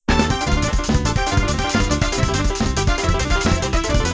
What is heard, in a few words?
Jazz